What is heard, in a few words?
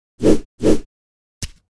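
Mob attack; SubBossmob; 욱일승천류; 가토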